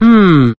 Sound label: hmm, human; Voice; ooh ahh